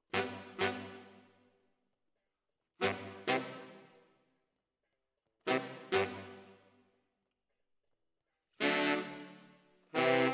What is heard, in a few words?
ORTF SCHOEPS, piano